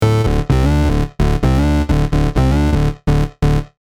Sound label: bassline